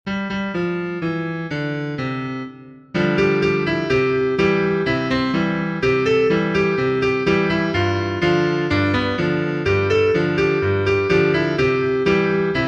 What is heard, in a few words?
Karaoke